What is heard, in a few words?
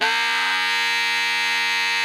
TENOR GROWL A#4, Saxophones; Greytsounds; Philip Wolfe David Crozier's Saxophones